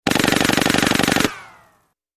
Fast Silenced Machin, silenced

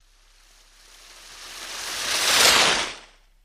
Water By Doppler

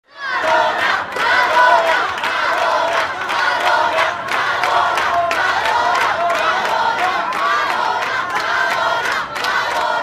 Rock Diva At Balcony, concert

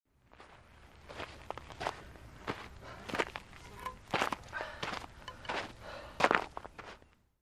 Baseball FS Grab Bat; baseball fx & games

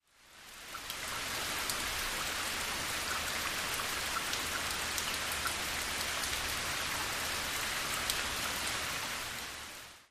SOFT RAIN, rainfall

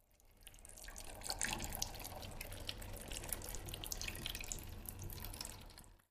Trickle Water Drips

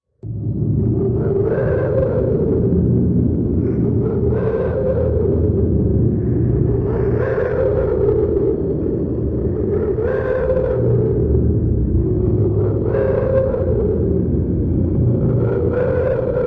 Frozen Echo Eerie Wind V, eerie ambience

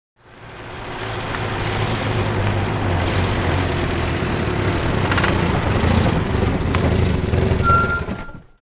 Stop Off Austin, austin

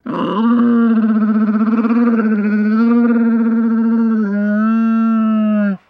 Camel Moan Gurgle; camels

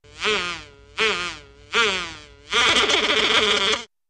Funny Fly Buzz

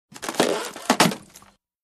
Ice Break Lht Stress S, breaks exterior

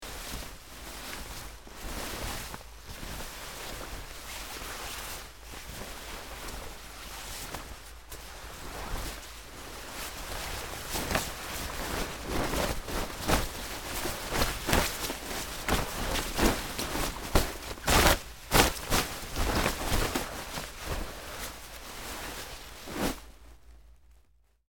Heavy Cloth Rustle

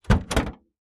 SUV hood release; trunks & hoods

